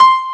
PIANO C5; St.Piano&Strings

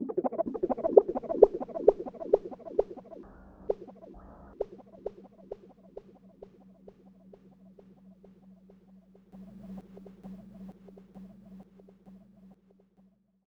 Rhodes FX; EZRollers

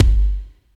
VERB KICK; East West - Phat & Phunky

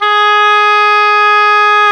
OBOE, Orchestral Winds, Oboe mf f

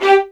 G4, LOUD VIOLINS; Q Up Arts; Denny Jaeger Master Violin Library

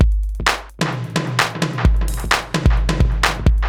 Space Echo HR-16B 130BPM

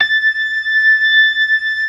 ORGAN LOWER MANUAL; Keith Emerson Signature Series

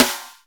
snare R8 RIM; Roland - Rhythm Section; Snares 1; Sn Modules 1

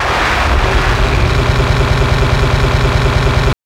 CYBERGUN R, Hard & Loud Techno

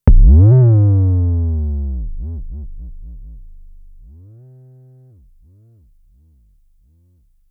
FX, Drum Machines; Fricke Schlagzwerg

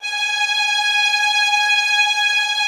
TREM G#5, Violin; Q Up Arts; Denny Jaeger Master Violin Library; PERFORMANCE

